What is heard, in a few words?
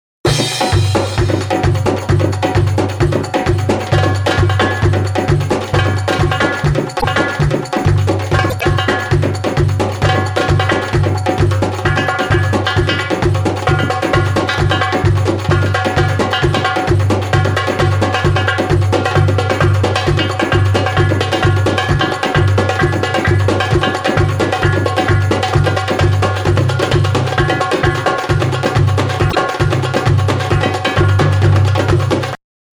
Drum Solo